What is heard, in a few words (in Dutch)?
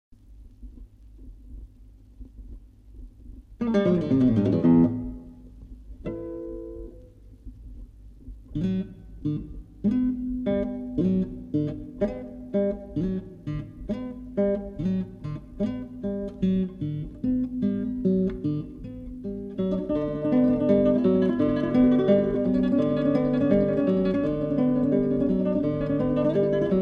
gitaar solo